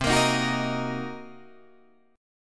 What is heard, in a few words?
C Minor 7th Flat 5th Guitar Drop D, chord